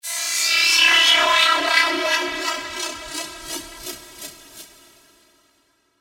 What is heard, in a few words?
Wobbly; SERUM FX